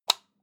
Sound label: light switch on hard; schalter